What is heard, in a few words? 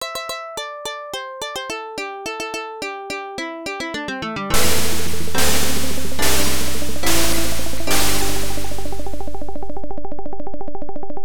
Round Complete; Shanghai 2; arcade